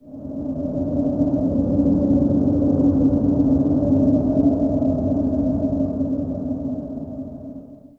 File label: wind; DAoC